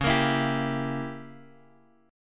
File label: C sharp Diminished 7th C sharp down Guitar DADGAD